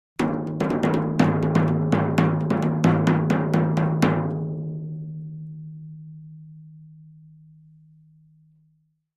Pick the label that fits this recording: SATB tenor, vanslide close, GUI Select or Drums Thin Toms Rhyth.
Drums Thin Toms Rhyth